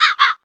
crow flying away; 摇钱树

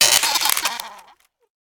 fright activation; 摇钱树; ITEMS

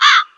crow appearance; 摇钱树

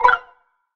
insect appearance; 摇钱树

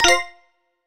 take fruit; 摇钱树; ACTIONS

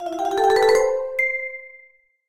goal reach; 摇钱树

